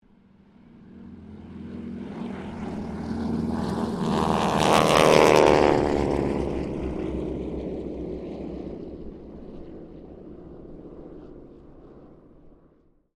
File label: AIRCRAFT HARVARD SQUADRON 3 TAKEOFF; Southern Knights Squadron; Airplanes; Vehicles